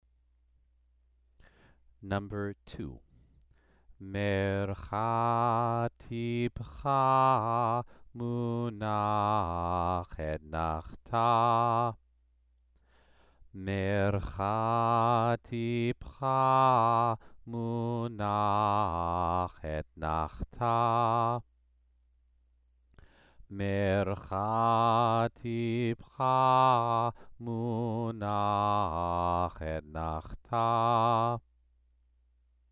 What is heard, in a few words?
Torah trope exercise